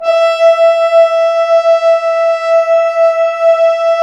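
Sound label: French Horns sfz; Roland L-CDX-03 Disk 2; F.Horns 2 f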